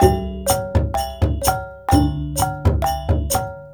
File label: WYANG R, Heart of Asia; Spectrasonics - Heart of Asia CD2; W.KULIT